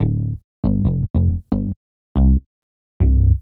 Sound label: Classic Bass, Drum N Bass